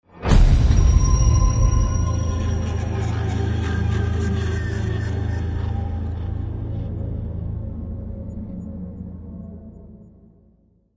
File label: Hit Title Deathtrap (A); Brand X Music; Brand X Music Catalogue - Editor's Essentials